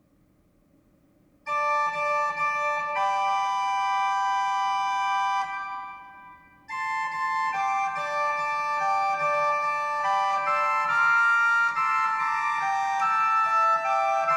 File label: Alleluia II (Instrumental); The Krasiński Codex (Fifteenth-Century Music from Cracow)